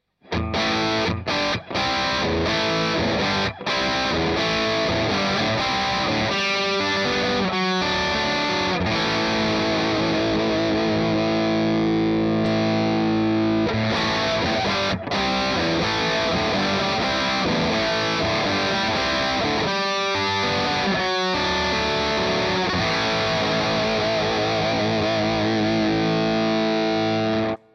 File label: OD Pull Push